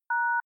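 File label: DTMF hash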